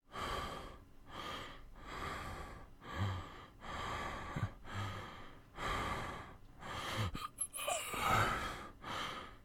HOMBRE FATIGADO; yesterday origins gamerip 2016 switch ps4 pc ios macos

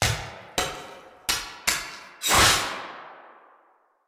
Cuchilladas

